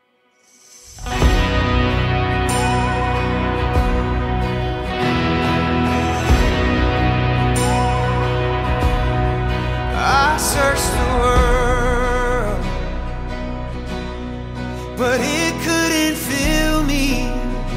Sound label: Studio Version